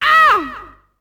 ATTACK; UFC Tapout; XBOX

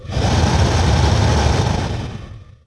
creak

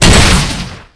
Launch; Quantum Redshift; jordan

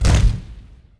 pot hit stone lg; objects; Star Wars Obi-Wan; XBOX

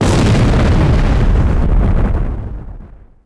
explo bunker; impacts explos

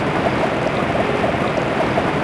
ocean, tracks

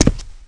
Walk Concrete; Player; Footsteps

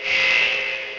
Landing Guide Alarm

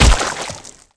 hit blood, skater; Tony Hawk's Project 8; XBOX

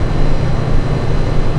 amb vending machine; Tony Hawk's Project 8; XBOX

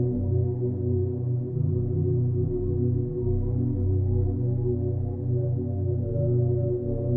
pad; Myst III Exile

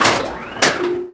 elevator teleport; Tony Hawk's Project 8; XBOX

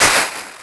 fence; WhiteOut

